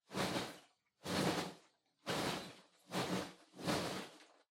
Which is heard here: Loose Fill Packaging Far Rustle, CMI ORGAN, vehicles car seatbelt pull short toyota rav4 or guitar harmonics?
Loose Fill Packaging Far Rustle